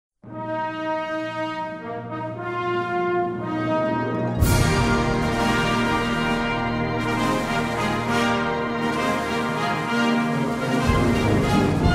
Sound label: sound Bgm